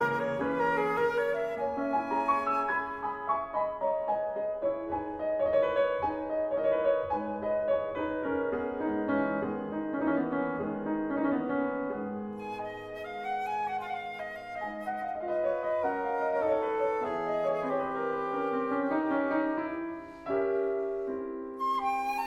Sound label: air vaudois op 108 for flute and piano allegro moderato